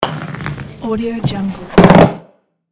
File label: indoor golf putting